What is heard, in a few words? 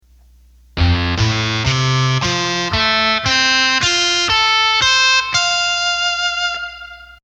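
Rock Guitar, Roland accordion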